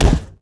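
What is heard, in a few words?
rubber large, Impact; Cars; XBOX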